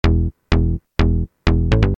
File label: bass